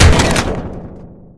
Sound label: bumper car hit; tf